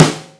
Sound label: Drum